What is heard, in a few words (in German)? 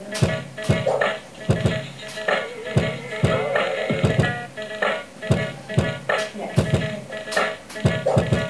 musik